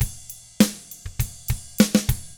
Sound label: ROCK, Drums